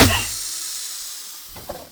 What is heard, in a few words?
Car Tire Pop; Warframe; Nineteen Ninety Nine